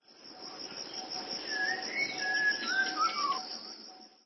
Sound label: jungle, terrain; Kings